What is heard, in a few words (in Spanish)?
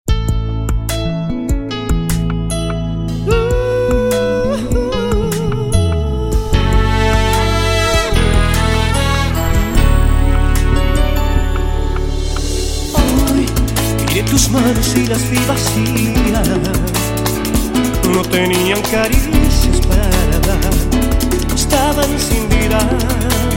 Cuarteto